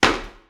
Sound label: snap